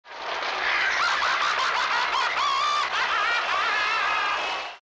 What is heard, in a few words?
laughs; the phantom